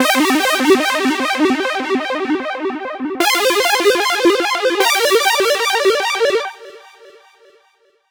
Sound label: minor c4 150 bpm; game-nation